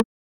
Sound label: hover